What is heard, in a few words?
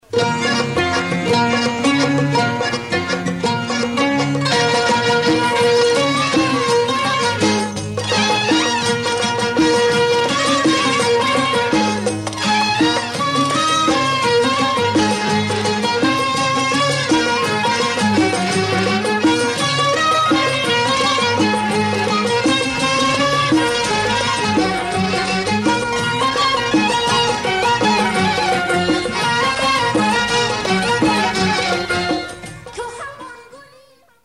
Music